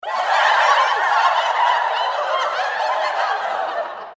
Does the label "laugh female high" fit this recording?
Yes